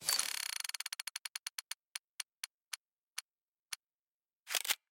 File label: case roll compound